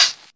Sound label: short hihat sample D key, Loudest frequency: 4742Hz; hat samples